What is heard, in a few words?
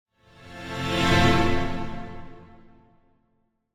Symphonic Hit Short Strings Warning Calls; Hollywood Edge; sound designer's toolkit v2